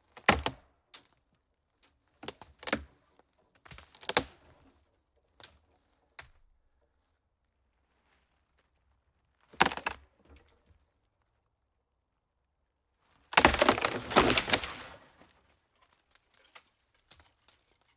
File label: taking down a dead tree